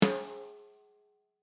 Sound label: SN top